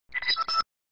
Sound SFX, flipper; sttng

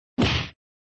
Sound Slash, flipper; Slash v1

